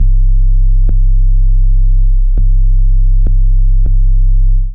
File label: 808 Boom Kit groove 101 bpm; MIDI Clips; Ableton; Factory Packs; Beat Tools